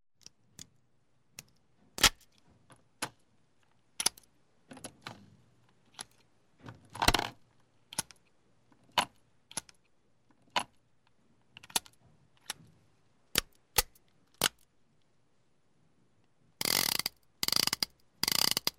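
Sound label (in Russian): sounds of a revolver, 38 calibre weapon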